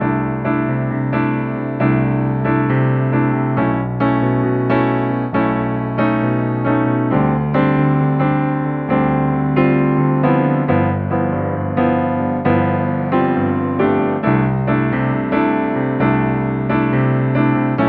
bossa; weeklybeats 2024

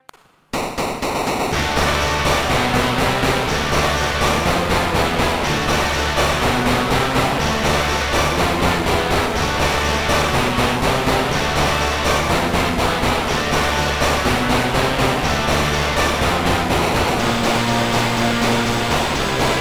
seagull chainsaw, seagull anarchy; weeklybeats-2012

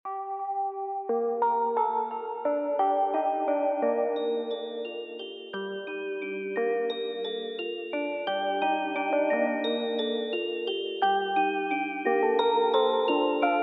lofi drum and bass

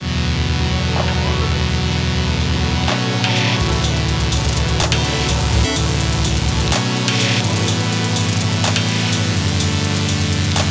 happy future idm music; weeklybeats-2020

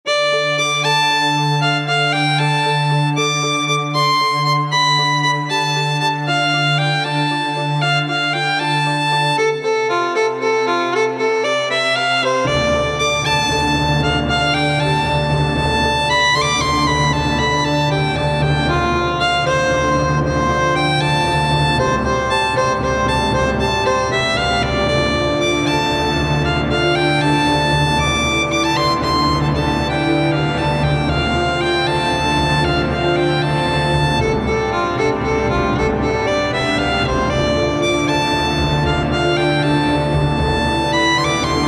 orchestral

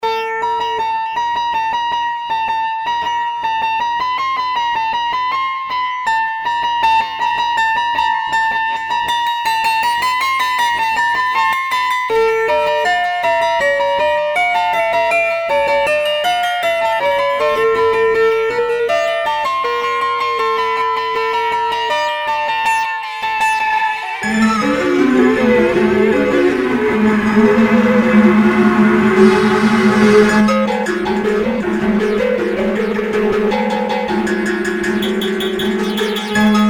piano; hells chorus; demise; weeklybeats 2014

